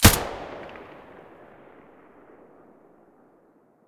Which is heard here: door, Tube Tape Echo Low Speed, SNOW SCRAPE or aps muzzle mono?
aps muzzle mono